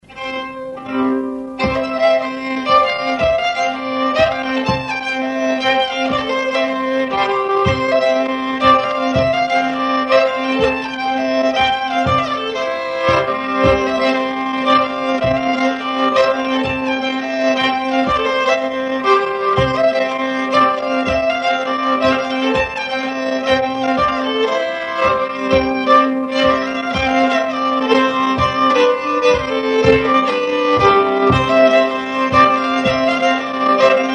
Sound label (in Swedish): Polska; Polska M Wessln